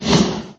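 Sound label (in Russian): звук нападения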